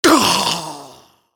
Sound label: engineer pain severe; svencoop addon; tfc; player